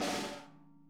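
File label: snare buzz; Sonic Reality; Interactive Drum Kits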